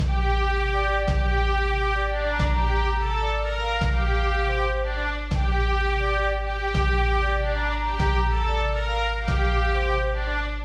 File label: holiday st patricks day, music